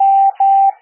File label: M morse code